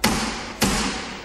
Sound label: GAVEL BANGING